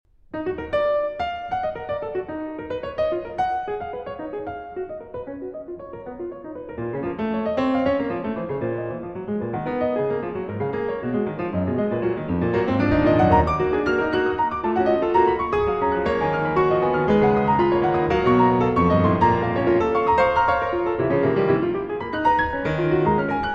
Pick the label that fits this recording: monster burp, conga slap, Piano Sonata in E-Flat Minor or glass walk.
Piano Sonata in E-Flat Minor